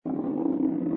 Roll Wood Wood

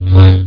Lightsaber Swing; CastleMiner Z; Xbox 360

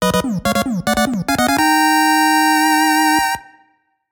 Stage Clear

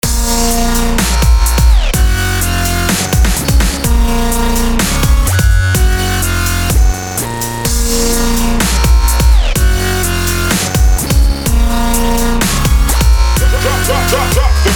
Driving; Drive or Die 3

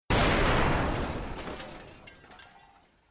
striker sound; Arc of Templar

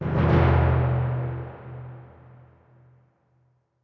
Creep Tymp

